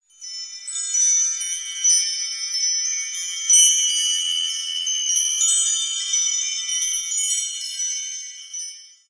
tinkling bells

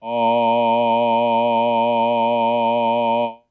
synth vox A sharp 1, Synthesized Male Voice; multisampled; vocal

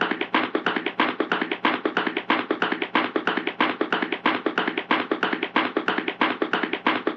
vintage cartoon run; Cartoon Sound Effects Kit

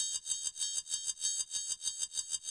191 scraped bell percussion

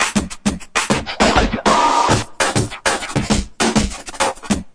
202 bpm, drum loops and breaks; 181 bpm plus

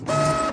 machine tool press; Weird and Interesting Hits; single hits

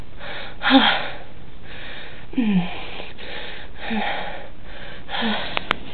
breaths, Silly Lo-Fi Orgasms

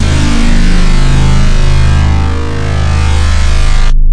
casio bass; Basses

